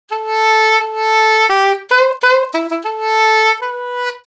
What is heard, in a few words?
sax